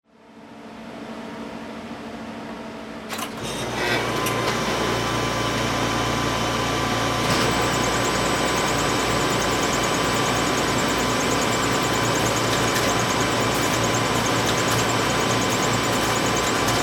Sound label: Loud fotocopier running sound